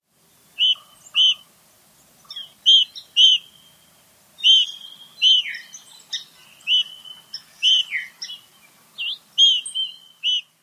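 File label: rose-breasted grosbeak weep calls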